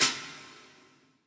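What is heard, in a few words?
open hi-hat; eddyflux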